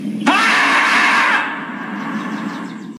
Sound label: Screaming Beaver